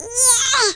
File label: push; lomax